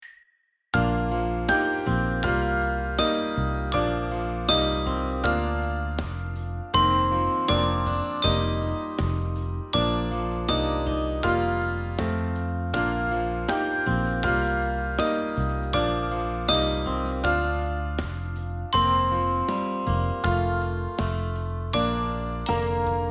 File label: London Bridge